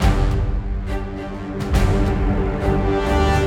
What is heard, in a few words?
Boss Start 138bpm